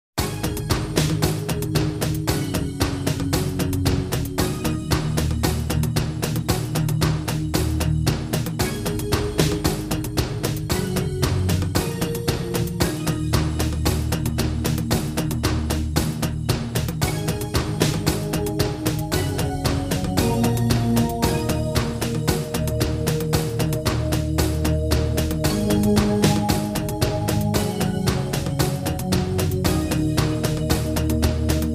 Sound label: Jingles; 90 DDD Jingles